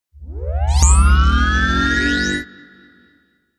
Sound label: vacuum